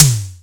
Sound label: SDSV Snare; Simmons Drums